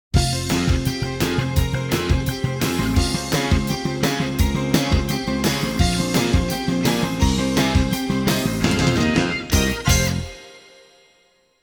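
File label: Fanfare - Instrumental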